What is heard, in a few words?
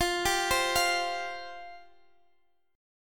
F Suspended 2nd, down Guitar Open G